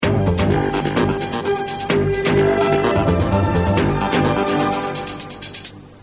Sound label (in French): jingle serie